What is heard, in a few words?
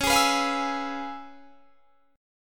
Db 7th Sharp 9th Db down, chord shape; Db7#9 strummed